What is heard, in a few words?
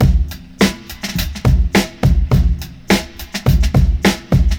API 2500 Before